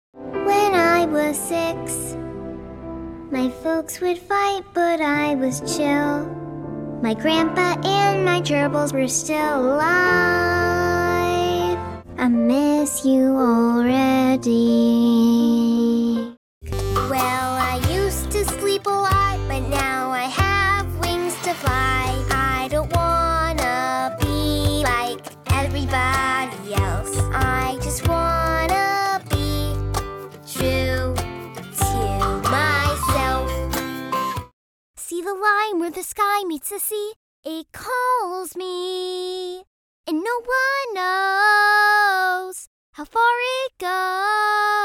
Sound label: kids singing